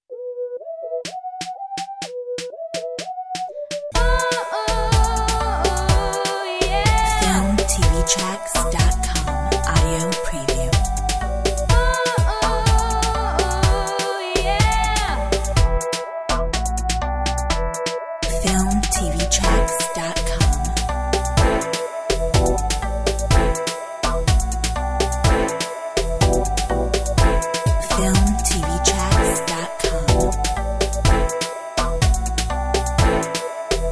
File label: Dancehall queen